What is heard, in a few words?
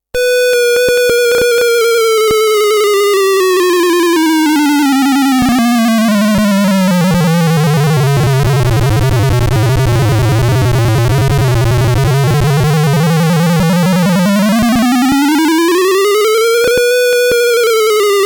arduino synthesiser sample sound